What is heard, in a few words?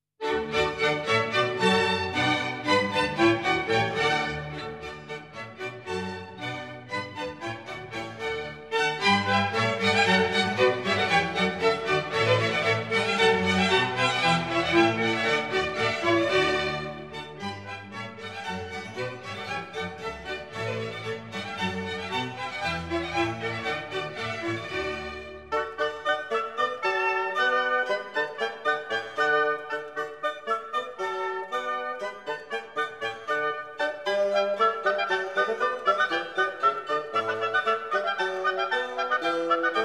Suite Nr. 2, D Major, Water Music, Bourrée; Haendel; Wassermusik & Feuerwerksmusik